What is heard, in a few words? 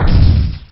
Explosion; Little Bombers Returns